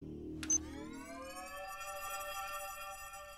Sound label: Message Sound; SAO